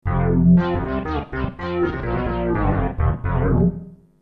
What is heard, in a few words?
Poor Bass